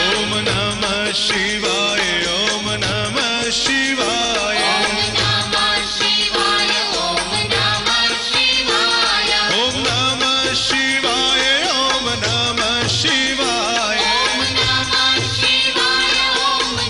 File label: om namah shivay